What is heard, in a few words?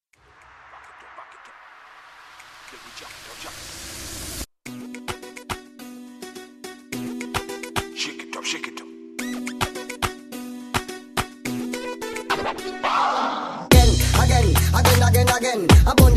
Icebreaker slow